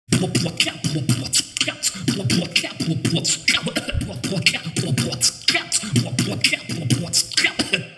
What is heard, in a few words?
beatbox 16 beats; jungle